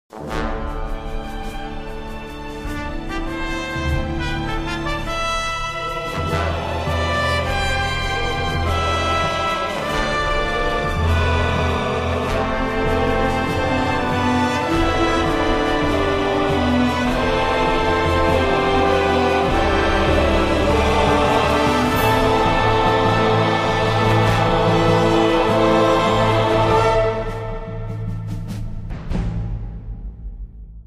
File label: Death; Star Trek Klingon Academy; windows